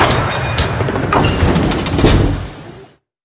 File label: attack all around